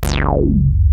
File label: ARP 2600 C#2, synthesizer; Hollywood Edge - Giorgio Moroder Rare Synthesizer Collection